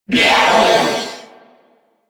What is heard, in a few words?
Purgatory Warrior Death, Barks; Warframe